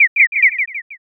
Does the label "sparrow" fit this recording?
Yes